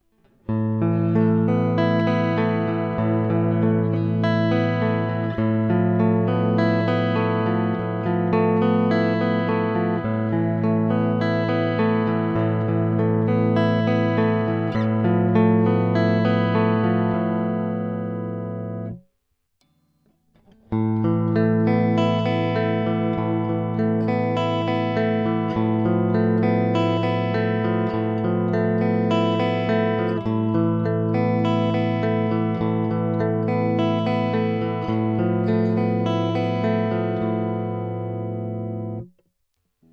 VOX DI Chords

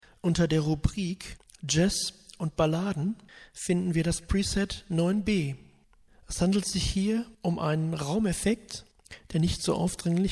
Jazz

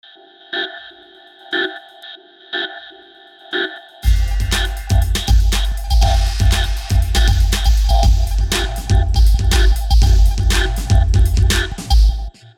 Softube Vocoder Drumloop

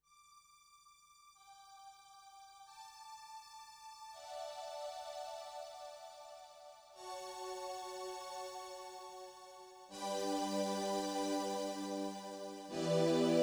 Strings

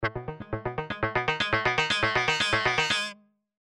arp; ms liberator

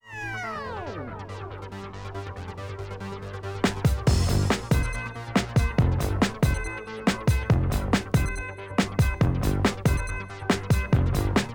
Reloop Concorde Blue Instrumental

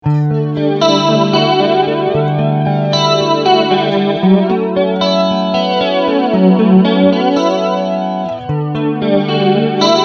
Flanger

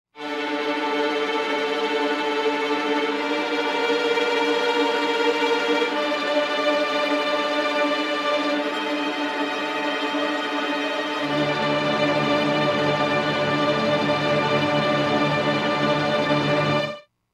tremolo Strings

